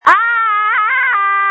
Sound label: screams